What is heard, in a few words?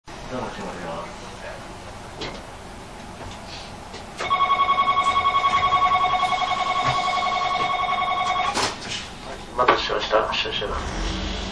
door; entetsu